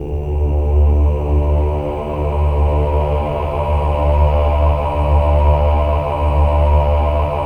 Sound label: OH-AH D2, choir; Spectrasonics; Symphony of Voices